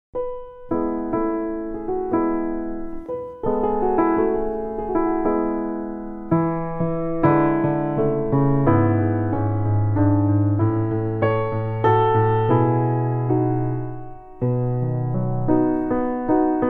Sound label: Lutheal Piano, Piano; Bach; edition mim